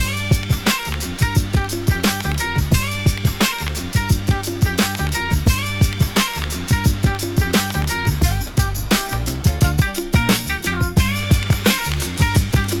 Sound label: orchestral trailer; In the Hall of the Mountain King